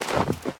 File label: Foot Deep Snow Run